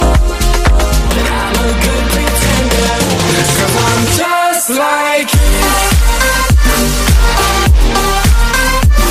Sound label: hot electro ringtone